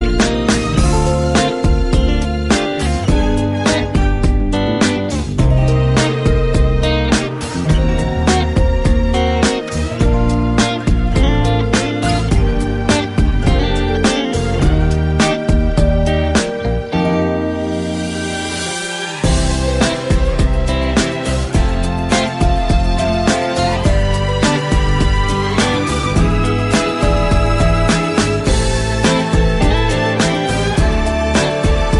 Instrumental

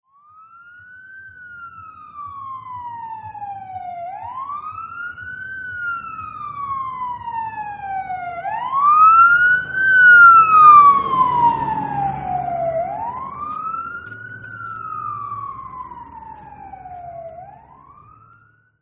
fire engine siren yelps and wails

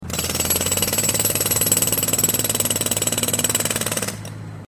jackhammer sound

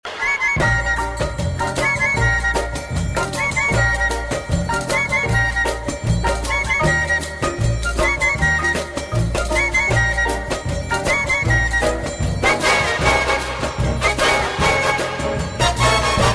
bossa nova

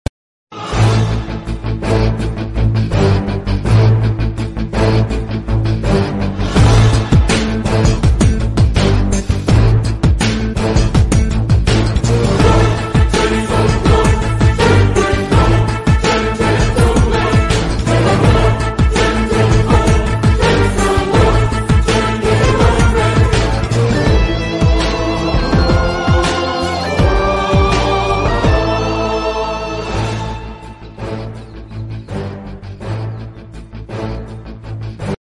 Bgm tamil; Ajith; Veeram

